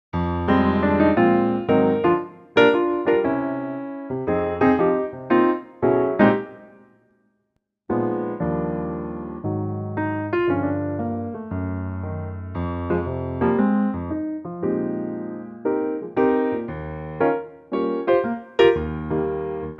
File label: Eb piano; Pianotrak; key - Eb - vocal range - Bb to Eb